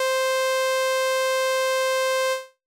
Saw Wave

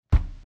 Texture and Smack

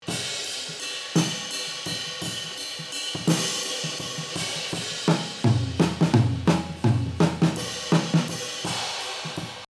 Overheads Attack Down

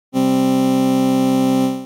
Synth With High Sustain

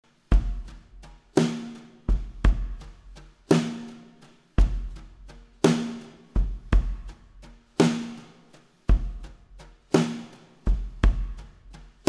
Drums; Kleverb

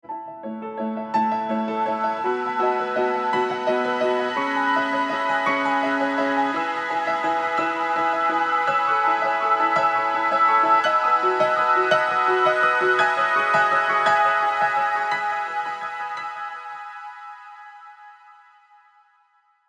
Piano With Adaptiverb

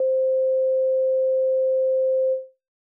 Sine Wave

